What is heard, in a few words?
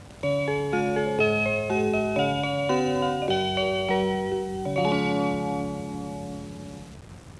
chime; 上越・長野新幹線のチャイム